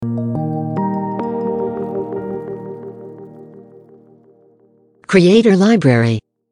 Audio Logo

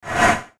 Short Swoosh

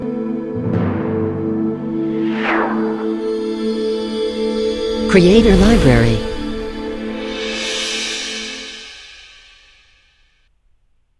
Logo intro

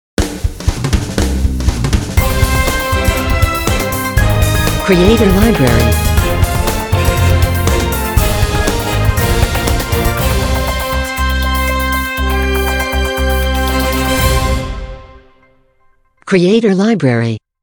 Intro News Logo; Logo-Idents

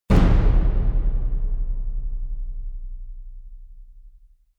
Trailer Hit

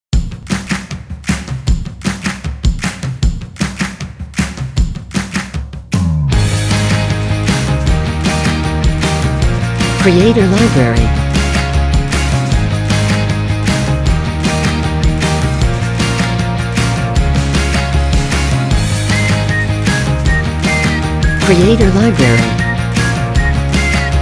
Upbeat Pop

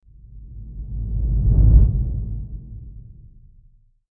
Swoosh

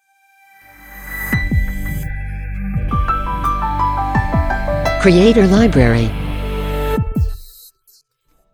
Elegant Piano Logo; Logo-Idents

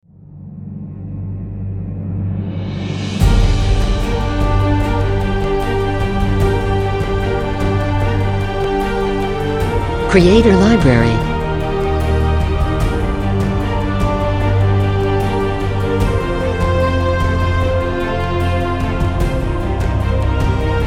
Intense Cinematic Trailer

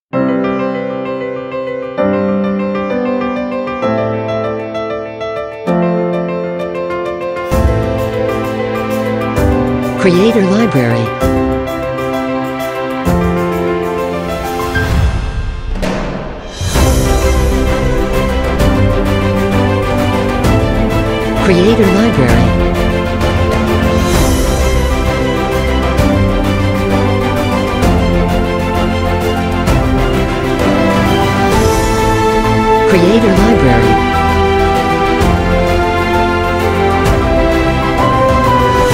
Inspiring Uplifting Trailer

